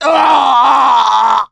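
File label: death scream, male; survivor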